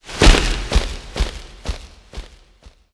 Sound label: Bahro Wings